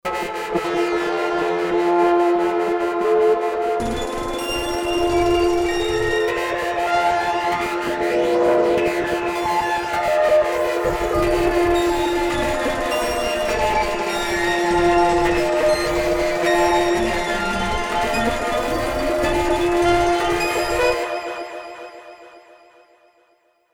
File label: pad metal, synth